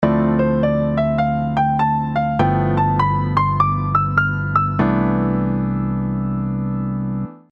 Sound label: II V I mixolydian